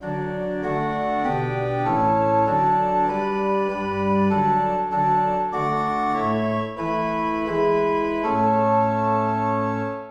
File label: Organ; hymns without words